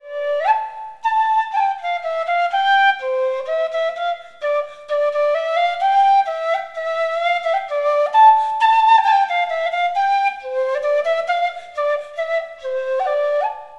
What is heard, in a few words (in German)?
quer c